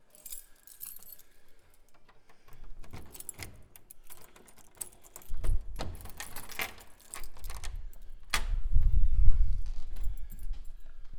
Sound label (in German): Tuer Aufschliessen